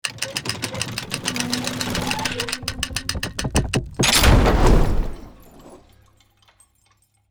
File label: Catapult charging and shooting medieval